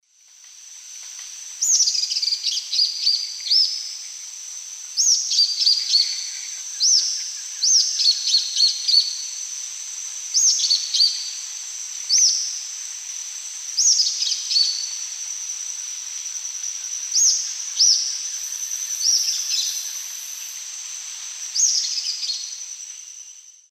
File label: Tyrannopsis sulphurea, calls 2 birds; S. America; Eastern Lowlands